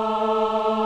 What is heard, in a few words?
forte